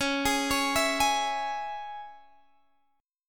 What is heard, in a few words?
C sharp Minor C sharp Guitar Standard, chord; arpeggiated; JGuitar